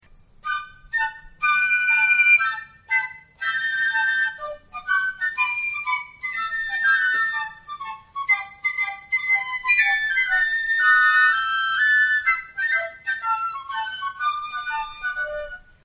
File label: concert, flute; AUTUMN concert 2016